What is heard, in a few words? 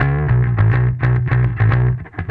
Bass